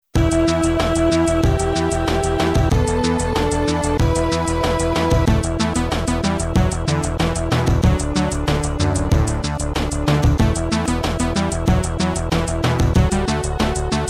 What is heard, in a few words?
High Score, Amiga; Amnios; Moonchild